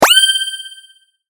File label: sword